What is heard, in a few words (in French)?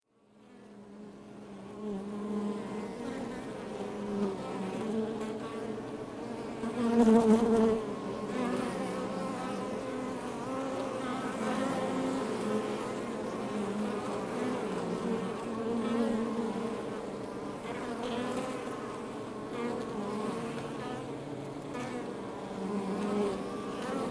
Ruche Exterieur